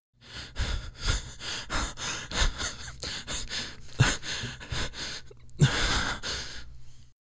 Fear

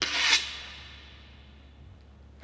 Sword Slice

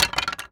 Wood Sticks On Wood Tabletop

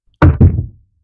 Heavy Object Drop

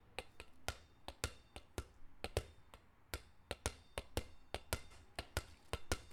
Large Ball Bouncing

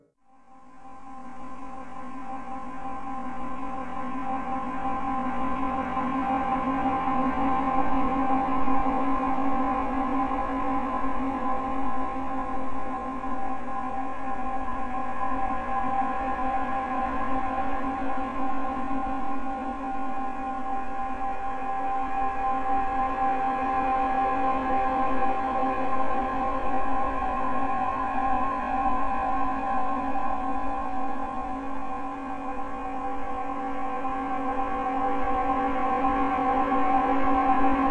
Porcelain

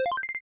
Acquire

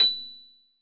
Piano Ff

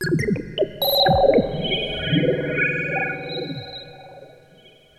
Space Blip

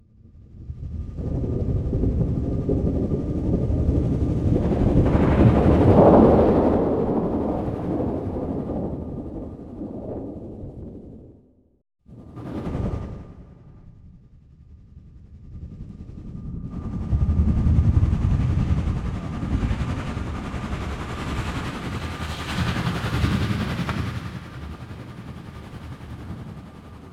Ball Of Storm

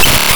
Error

